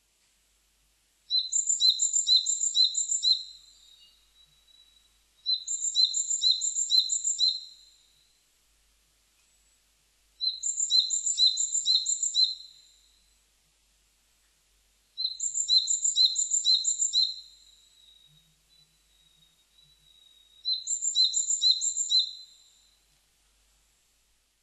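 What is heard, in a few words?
coal tit; higara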